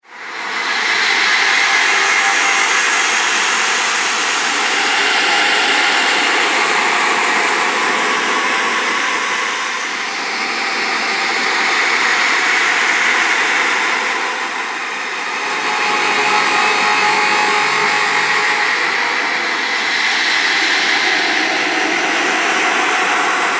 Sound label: Dark Electricity Drone; Scary Soundscape Sound Effects; Horror Sound Effect